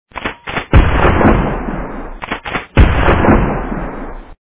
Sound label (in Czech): strelba